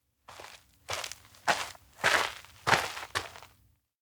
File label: steps outdoor ground walk; anemoi